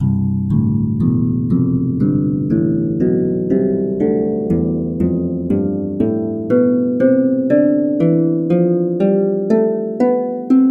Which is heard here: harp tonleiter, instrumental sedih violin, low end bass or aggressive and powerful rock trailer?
harp tonleiter